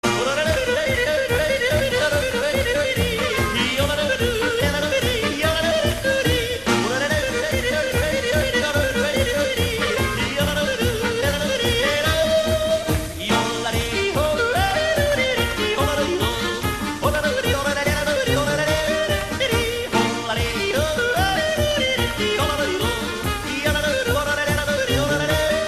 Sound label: Yodelling